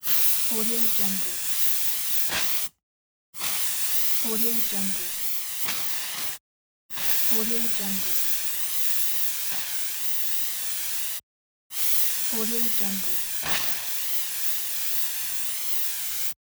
air valve bursts; lokohighman

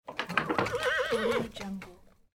stable game sound

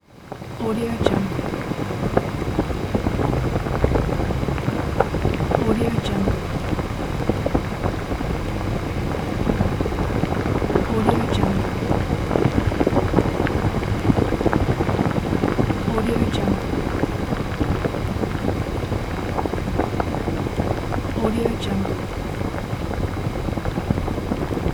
boiling water in saucepan; soundcreator

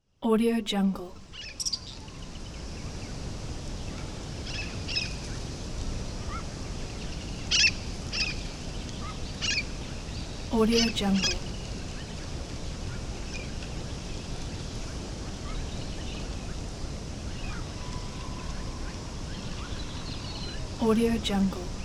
evening nature; capeaudio

